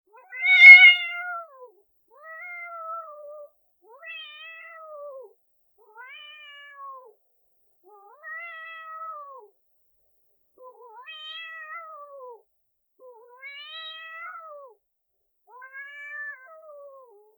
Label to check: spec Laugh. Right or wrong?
Wrong